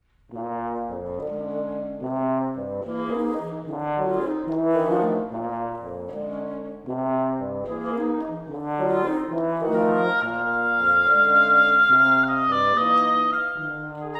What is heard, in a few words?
blues